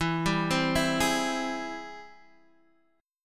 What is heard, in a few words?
Ab Augmented Major 7th E, guitar